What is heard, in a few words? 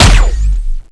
ice poke; wizardwars; spells